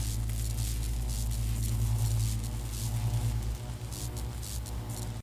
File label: electric current